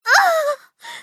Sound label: death; csgo